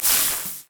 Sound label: bandage toss; LoL; badrobotamumu